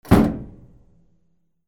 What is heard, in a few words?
HOUSE